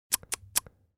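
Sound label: tongue clicking disapprovingly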